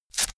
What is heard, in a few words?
velcro large open fast